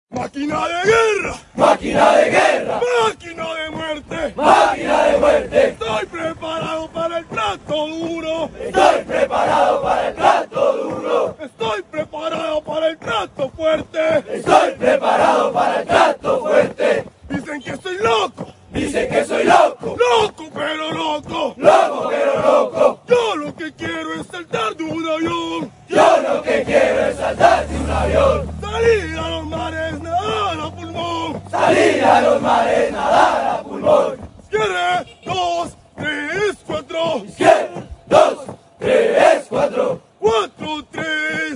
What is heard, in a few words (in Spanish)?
canto militar; maquina de guerra